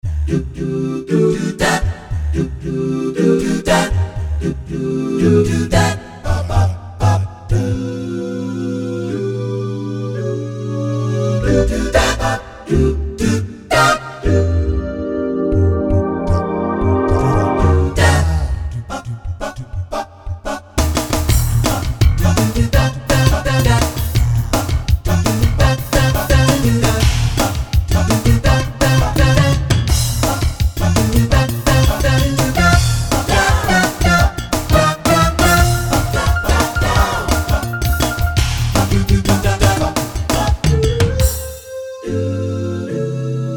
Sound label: Roland JV; roland-cartessons